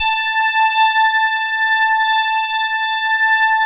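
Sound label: OBERHM OBXA, Oberheim OB-Xa; Big Fish Audio - First Call Vintage Keyboards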